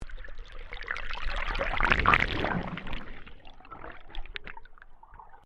Underwater Wave Small, Waves recorded underwater on Queensland's Sunshine coast.